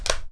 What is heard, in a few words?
ssg bolt down; firearms